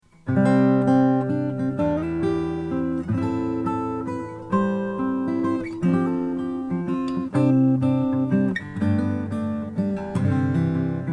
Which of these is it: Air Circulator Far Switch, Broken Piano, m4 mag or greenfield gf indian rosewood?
greenfield gf indian rosewood